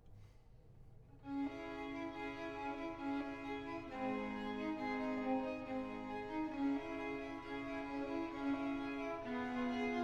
Violin Concerto, for violin, strings & continuo in D minor, Andante; Vivaldi; Concerti per Violino